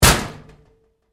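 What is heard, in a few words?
Door Locker Impact Close Up Hard, Metal Door; Doors; Big Room Sound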